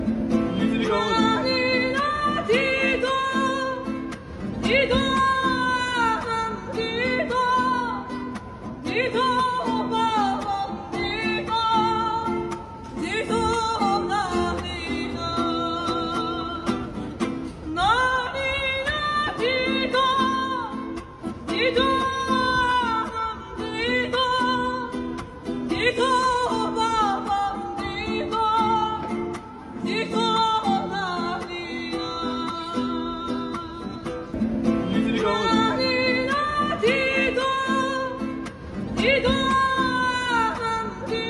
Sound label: acoustic live